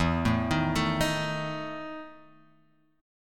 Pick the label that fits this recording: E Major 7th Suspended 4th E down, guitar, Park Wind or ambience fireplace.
E Major 7th Suspended 4th E down